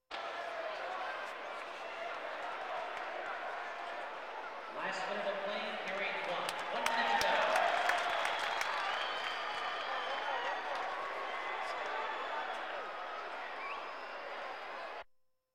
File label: Sports crowds